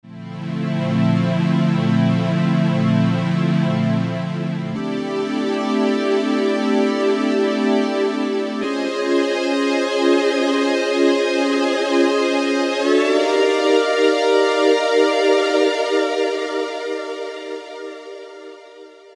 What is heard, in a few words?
Korg MS2000; Factory Patches